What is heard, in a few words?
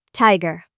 tiger